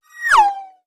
Tube Squeal Sweep

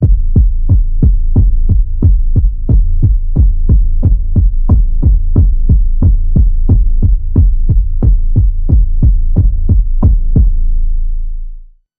Underwater Pulse Wave A

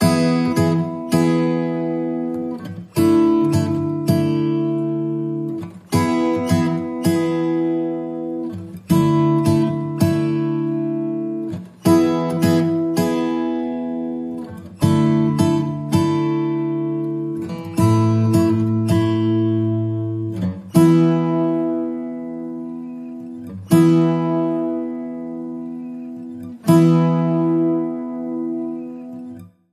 Positive Forward, No Drums

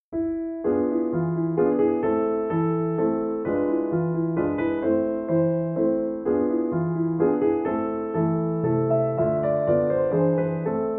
Landler D366 No4; Franz Schubert (PD)

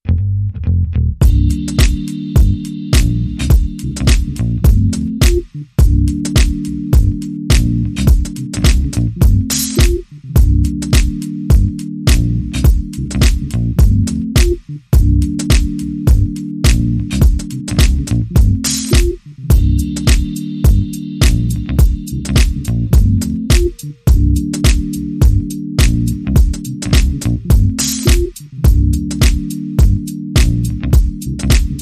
Next level Elevator Music

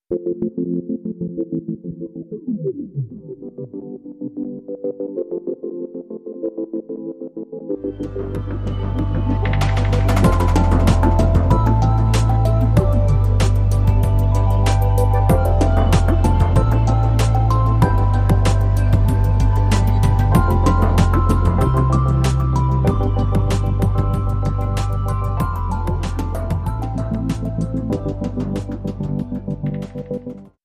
Powerful Impact

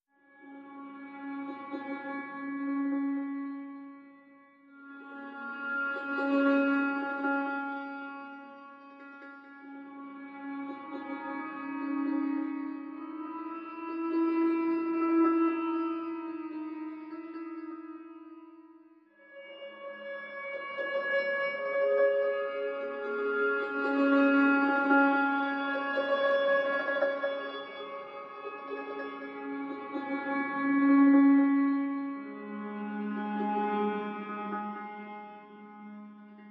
Piano FX

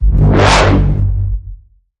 Bend The Bassline, G

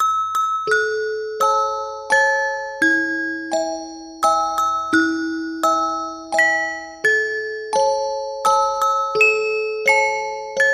Music Box Happy Birthday

